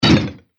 Robotic Menu Click

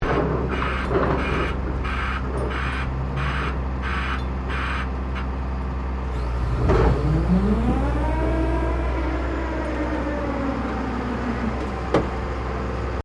Construction Drill Maneouvering; Various construction machinery

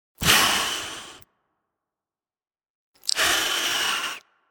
Cat Spit, Hissing sound of a domestic cat.; Animals

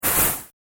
Harsh Airy Swish; Whoosh Sound Effects

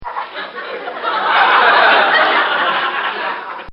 Laughter; Human; recorded in a custom built sound booth

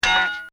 Comedy Effect Clang; Funny Sounds (Sound Effects:Comedy)

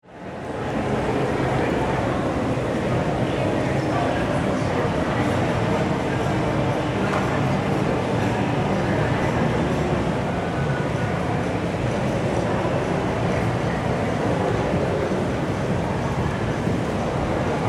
Mall Crowd Walla, Ambience, Mall, Crowd, Walla; Stores and Malls; Backgrounds

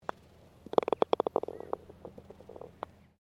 GOLF PUTT HOLE; Various Golf sounds; Sports

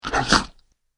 A Beastly Snort; Monster Sound Effects

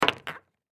Pool Ball In Pocket, Pool Ball In Pocket Full; Pool (Sound Effects:Sports); Billiards

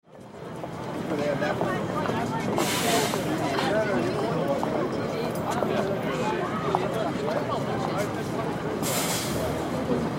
City New York Times Square; City New York Times Square People Walking; Ambience; Cities; Blastwave

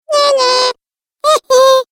Cartoon Game Voice Rude Sounds; Cartoon Voice Sound Effects

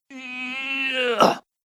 "Eeyaa", Male Strength; Recorded Voice Sound Effects

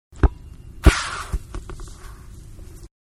Soccer Ball kicked into goal; Sports; Manhattan Production Music

